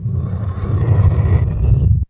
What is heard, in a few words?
IMP WHOOSH